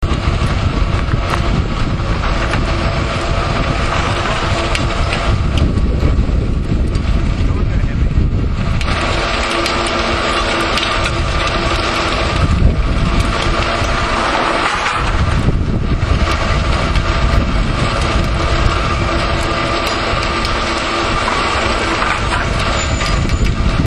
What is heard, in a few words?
cablecar